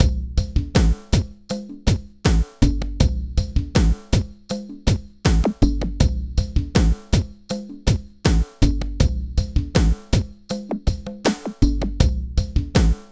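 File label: RAGGA, Left; INIS; Funky Xtreams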